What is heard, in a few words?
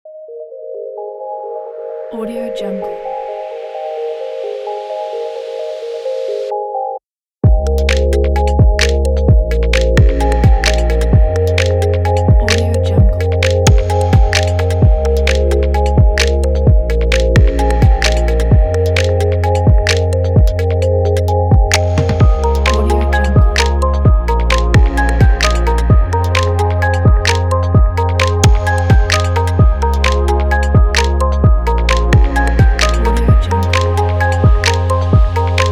gentle vlog; آهنگ بی کلام تبلیغاتی ملایم; Envato Elements